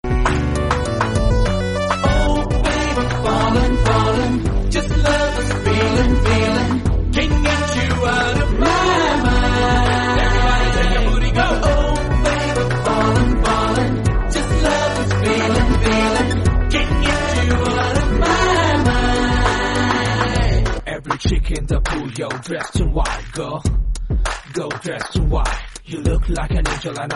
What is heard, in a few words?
Ringtone, Telugu; Vedam